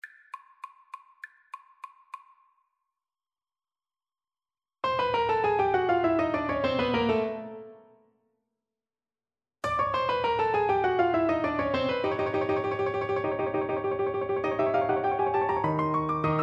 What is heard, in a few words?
Entry of the Gladiators ASAX